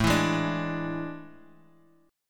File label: A Major 7th Suspended 4th, Guitar, Open D; chord